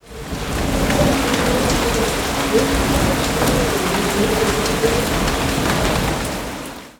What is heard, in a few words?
Rain Heavy with Wind Howling